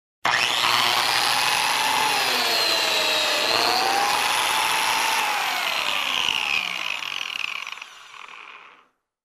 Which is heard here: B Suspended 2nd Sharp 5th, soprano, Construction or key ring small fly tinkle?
Construction